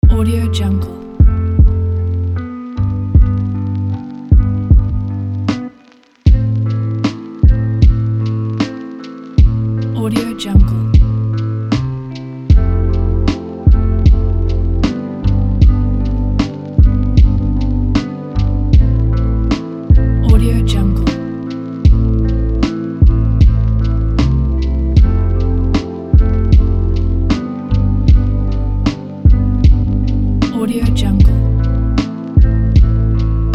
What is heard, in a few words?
lofi